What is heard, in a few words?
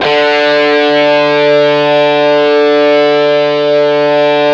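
Gibson SG D3, guitar; AKAI S-Series CD-ROM Sound Library VOL-8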